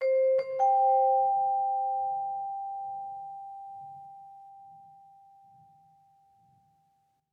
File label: Tannoy jingle; lego the incredibles 2018 switch; Mx Hub Prestory